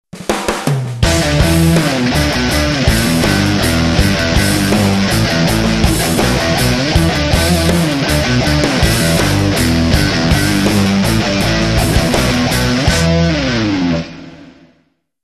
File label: Riff thang